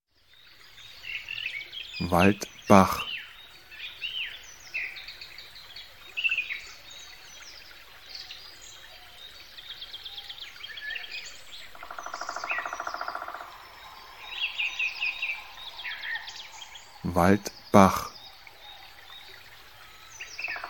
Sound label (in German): Wald Bach; Natur